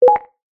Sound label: science fiction computer console beep button or response